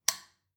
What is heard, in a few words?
Light Switch